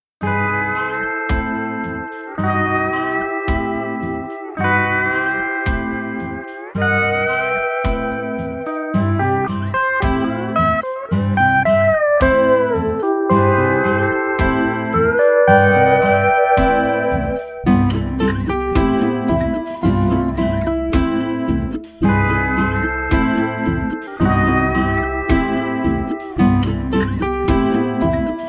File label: Island Shuffle